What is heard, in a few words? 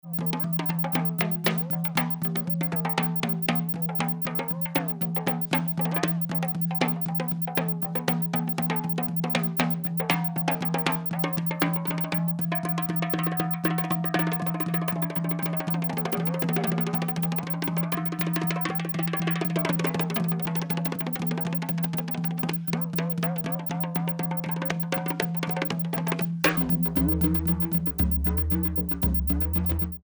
journey of the drums